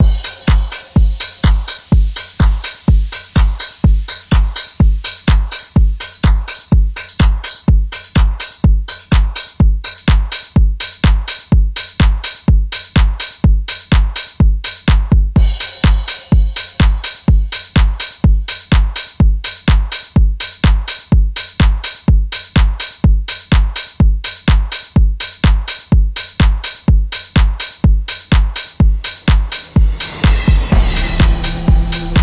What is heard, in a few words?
drums mix